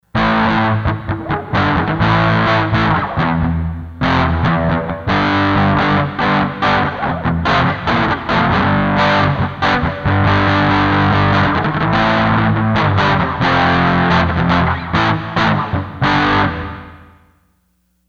Dire Crunch; Sony HR-GP5

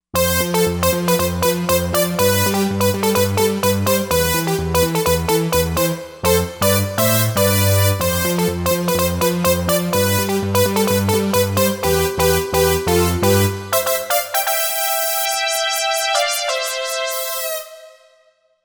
Brass Riff Brass

